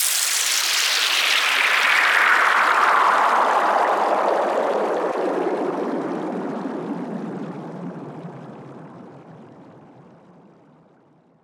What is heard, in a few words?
Downlifter Water; One-Shots; SFX; Ghosthack; Color Bass Essentials